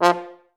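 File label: FHS1 staccato F2, Advanced Orchestra; Best Service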